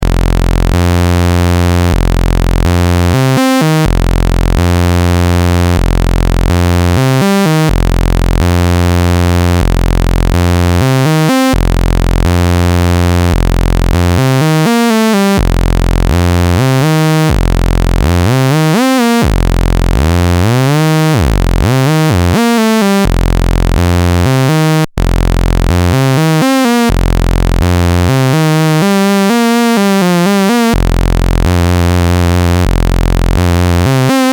Roland SE-02 Osc 1 Saw Pure

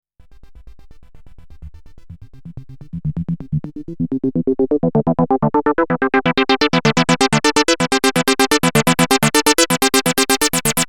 filtertype morph; MFB Dominion Club

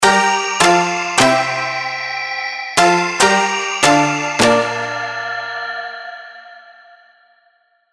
Dubstep Electro; Nexus 3; Dubstep Electro 4; Golden

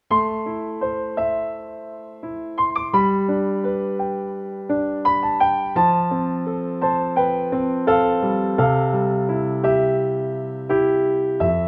Kawai ES 520 SK Concert Grand

